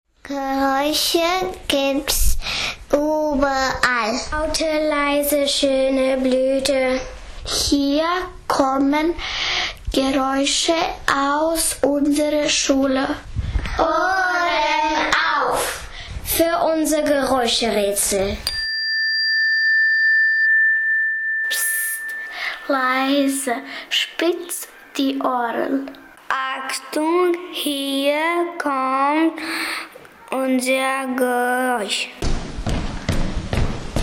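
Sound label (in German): Geräuscherätsel; earsinnig hoeren 2021; muehlbergschule frankfurt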